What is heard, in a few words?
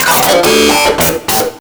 DISTLOOP, Big Beat Samples